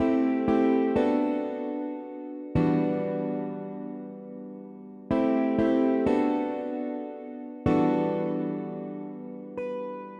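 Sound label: Guitar Loops A minor 94 BPM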